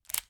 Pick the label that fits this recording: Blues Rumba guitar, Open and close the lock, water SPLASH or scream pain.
Open and close the lock